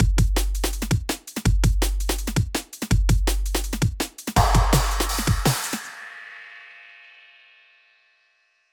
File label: punch dnb; rob papen